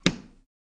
Light Switch Wall Switch

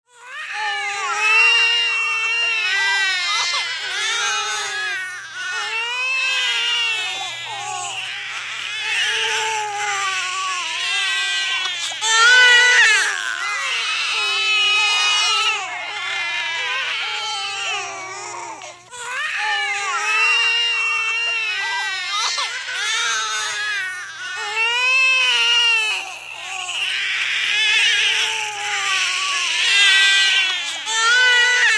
Newborns Int Straine, newborns interior

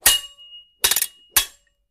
Fencing Clanks, swords

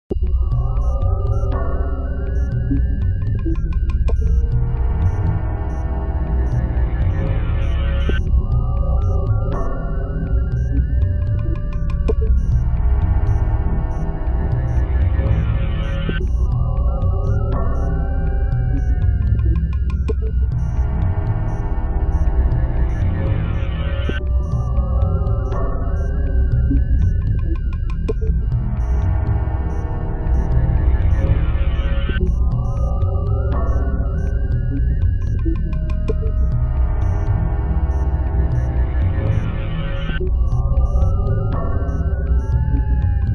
Music Bed Eerie Ambi

